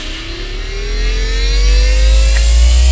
powrup

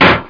bang; dosgames; brr

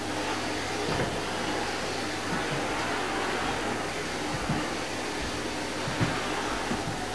vac; Mr. Wonder's Greatest Toyshop on Earth; OmniMedia

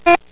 TICKER

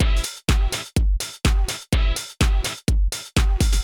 drum loop house 125; eJay

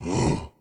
zombie; kult

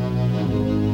empty trash; phantom

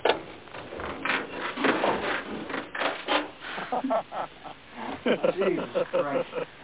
boot; reservoir dogs

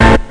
bug hit, chords

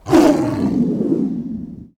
growl whoosh; Stanley's Sticker Stories; Edmark

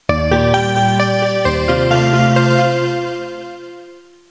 keyboard

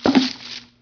quiver drop; vampire demo

